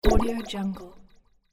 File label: warp zap; floraphonic